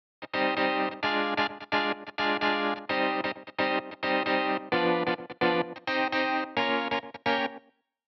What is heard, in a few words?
Guitar